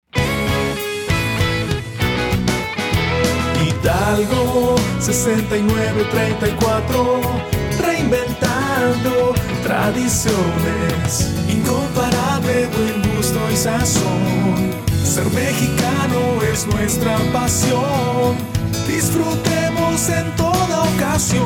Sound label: Jingle; Hidalgo